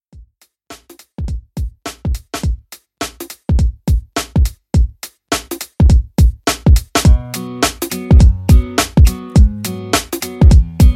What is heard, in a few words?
Pop Re Drum